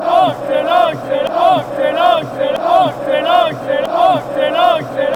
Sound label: chant; b moenchengladbach